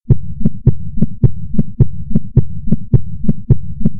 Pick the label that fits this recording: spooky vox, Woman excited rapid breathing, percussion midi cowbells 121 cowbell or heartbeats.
heartbeats